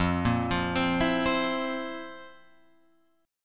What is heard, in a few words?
F Major 7th Suspended 4th, Guitar, Open F; FM7sus4 arpeggiated; JGuitar